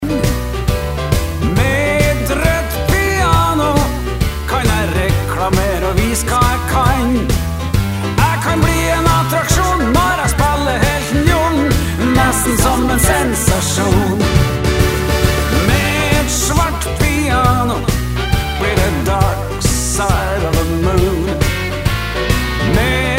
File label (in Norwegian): Med et roedt piano